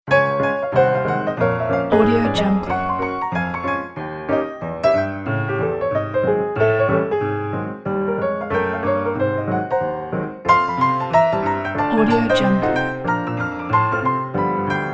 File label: great ragtime; music abc